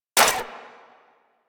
Pistol Mech; Warframe; Weapons; Thanotech

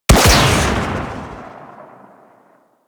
Torpedo Pistol Shot, weapons; Grn Torpedo Pistol

